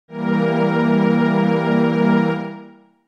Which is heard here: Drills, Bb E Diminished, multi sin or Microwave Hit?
Bb E Diminished